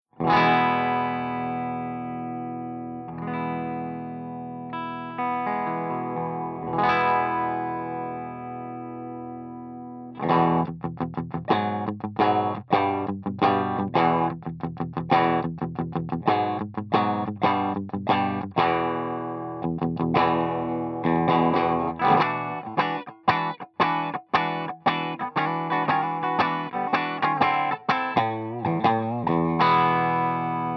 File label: BUCKINGHAM BRILLIANT MIDBOOST P90